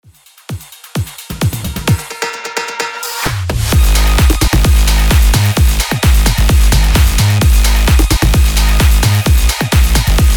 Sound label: edm bootleg breaker, 130 bpm